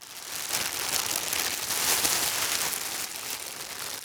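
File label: plastic wrap; duct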